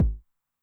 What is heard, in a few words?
BD Med Tune Med Decay, bass drum, 909; classic machines; 909mod